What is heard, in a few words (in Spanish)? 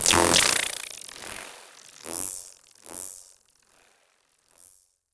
zzzt plop un sonido agudo, appear